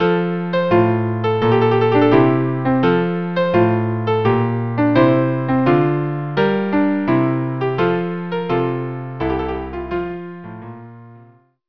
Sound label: aire trio; water music